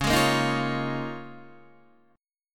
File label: C sharp 6th Add 9th C sharp down Guitar DADGAD